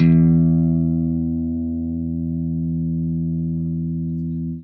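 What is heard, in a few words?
jazz line, guitar; Quantum Leap - Guitar & Bass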